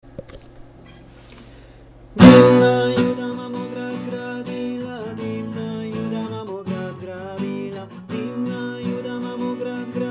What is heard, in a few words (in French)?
Voix grave; Dimna Juda